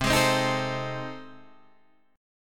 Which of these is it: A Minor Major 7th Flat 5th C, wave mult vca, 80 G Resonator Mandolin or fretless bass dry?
A Minor Major 7th Flat 5th C